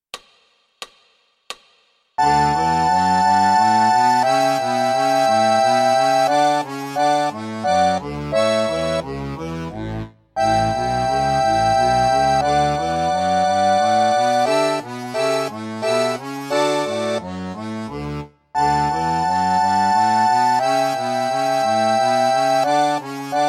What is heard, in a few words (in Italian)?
Fisarmonica; Czerny